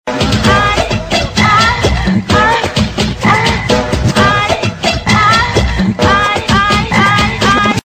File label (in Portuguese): gemido ai